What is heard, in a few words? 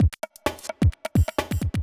electro kit 130